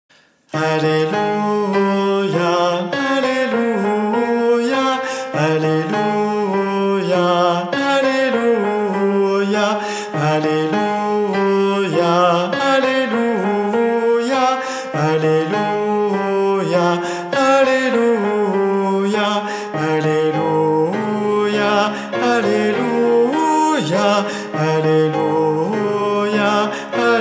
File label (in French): Alleluia ! - Guide voix - Ténors; Missa Pro Europa